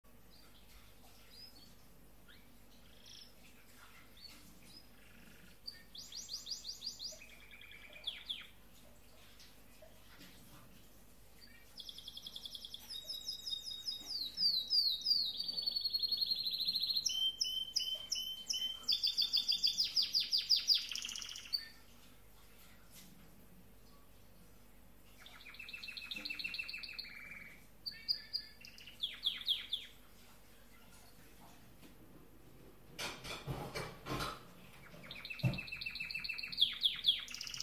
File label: Canary, Bird Songs